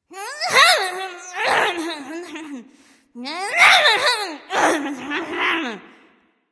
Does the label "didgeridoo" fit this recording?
No